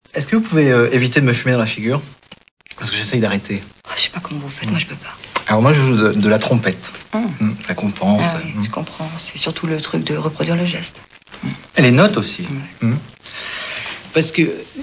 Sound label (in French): trompette